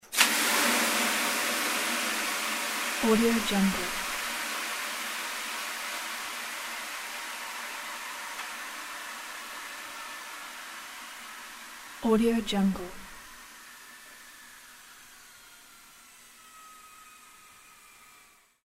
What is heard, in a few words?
water steam poured on stones in sauna